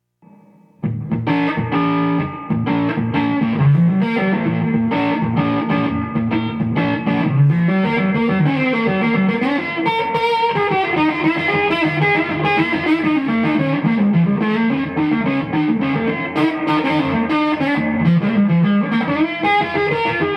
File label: LP Rock Neck PU Heavy Grind Reverb